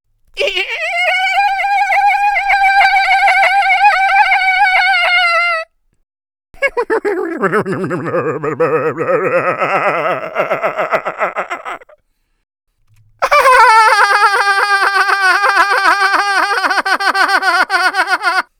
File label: Laugh Examples